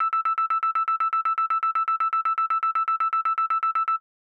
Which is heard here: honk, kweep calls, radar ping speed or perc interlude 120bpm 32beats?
radar ping speed